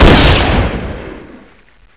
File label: shoot, xm1014; cscz